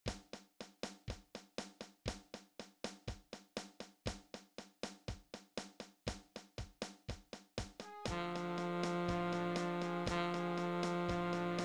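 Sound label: tenor sax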